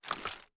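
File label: weapon foley assault rifle inspect; gmod; viper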